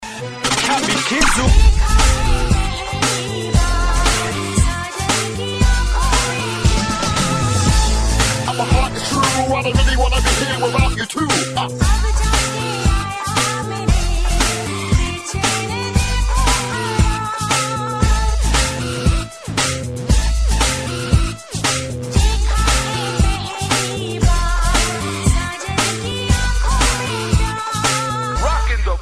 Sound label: rap mix